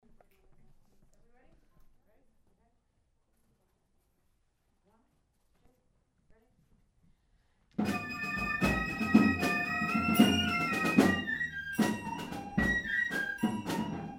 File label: Carol Bells